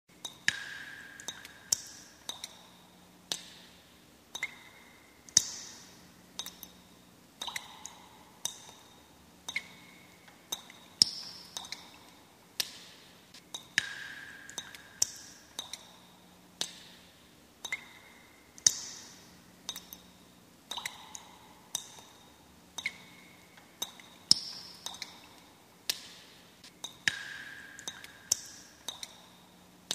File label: Water dripping sound effect